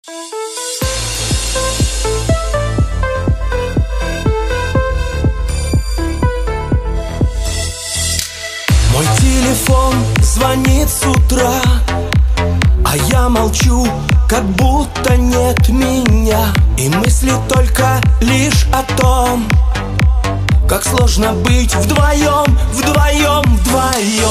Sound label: karaoke